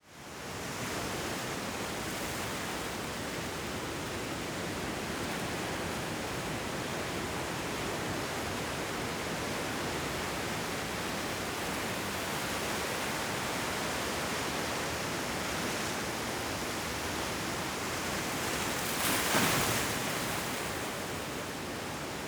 California Beaches, San Simeon Top of Rocks, Many waves crashing